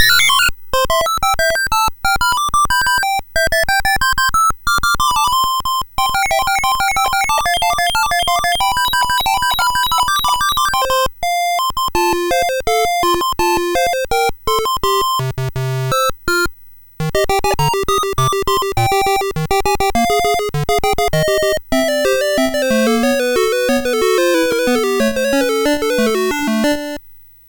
Musicbox, MOS6581R3; hvsc

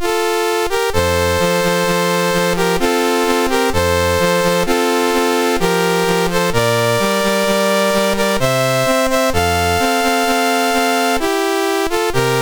Greece, National Anthems, MOS6581R2

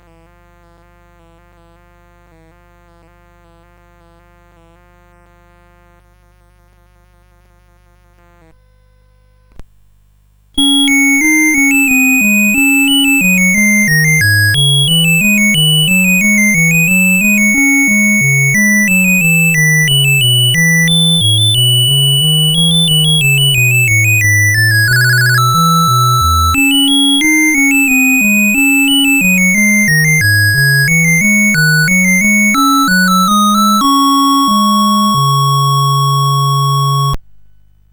Minuet; Minuet No4; CSG8580R5

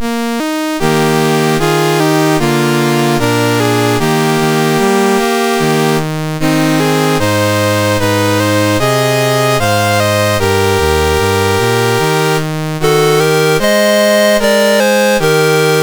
MOS6581R2, National Anthems; Sabah

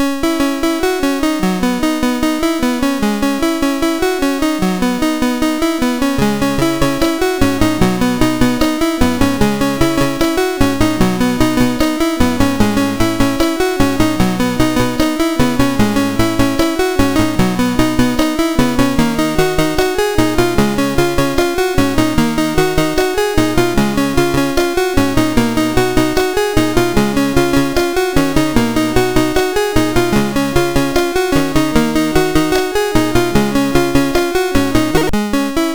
sid MOS6581R4; Escape from the Planet of the Robot Monsters

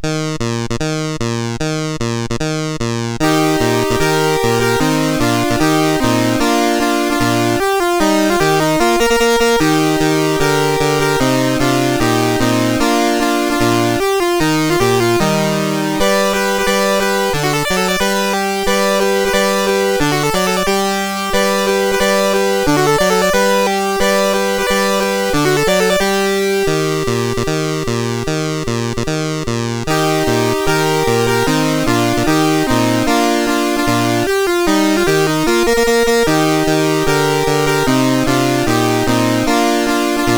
Fanfare, CSG8580R5

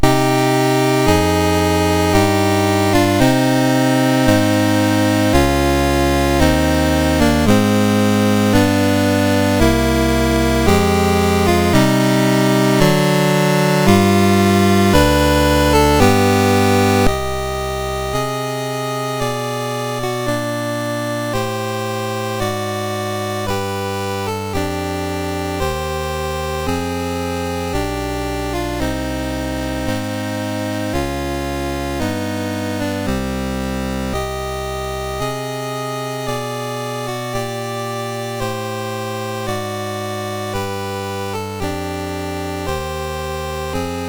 Prelude in C Minor, MOS6581R2; Op28 No20

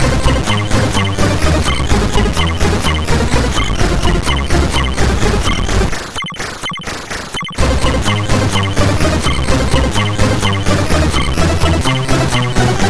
Music Samples, MOS6581R4

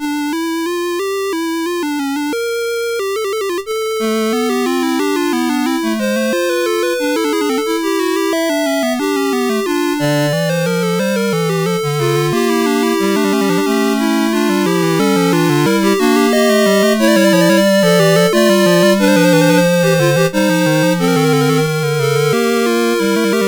Fugue VI, CSG8580R5

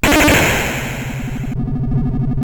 Die Alien Slime, CSG8580R5

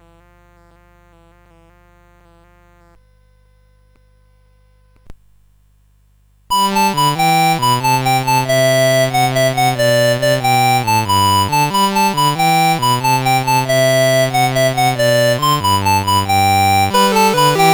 CSG8580; CSG8580R5

